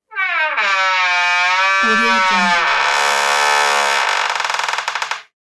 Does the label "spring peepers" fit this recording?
No